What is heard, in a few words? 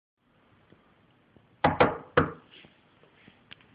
knock on the door